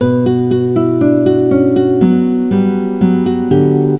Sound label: guitar